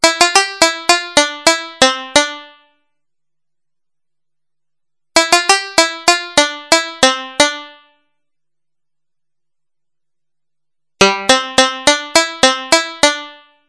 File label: banjo, yamaha tx81z